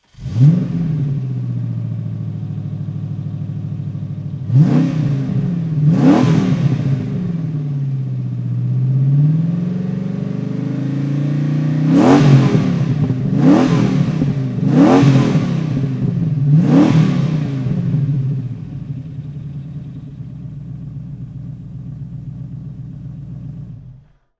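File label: tvr chimaera 500 throttle, engine sound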